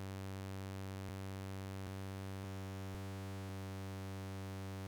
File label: dephase synth test